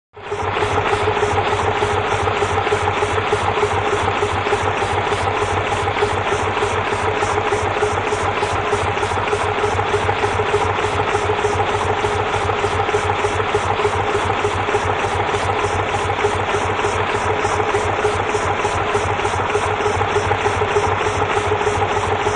Train Idle Diesel Train Idling Nice Valve Air Noise; Hollywood Edge 3-D SFx